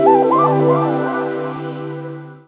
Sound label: Wibble - 9B, Jungle; Blu Mar Ten - Jungle Jungle - 1989 to 1999 Samplepack